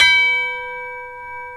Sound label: DULCIMR KOTO; OMI - Universe of Sounds